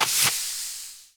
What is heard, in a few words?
Spin Button; netent